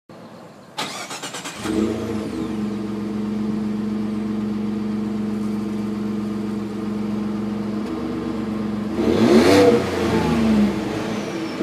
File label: Ford F-150 Exhaust